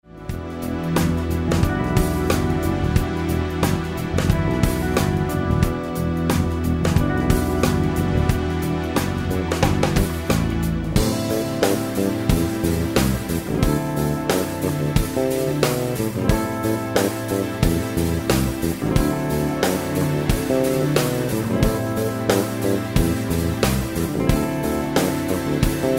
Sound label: smooth jazz jam